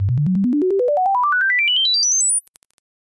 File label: sines up